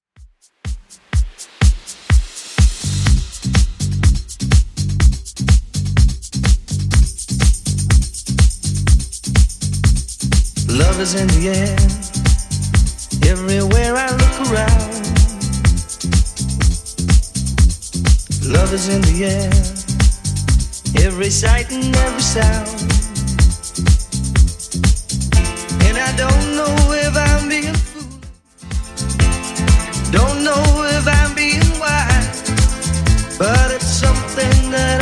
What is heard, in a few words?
80s Redrum 123bpm